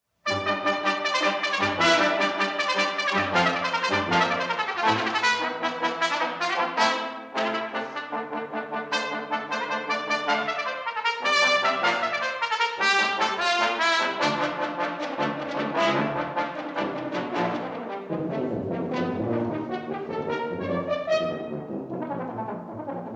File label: Brass Quintet Vivace